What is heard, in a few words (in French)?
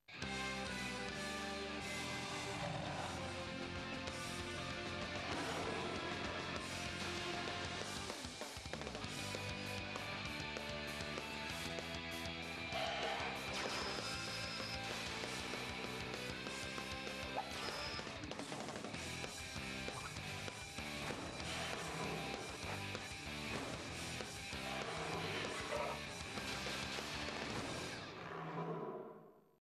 musique generique instru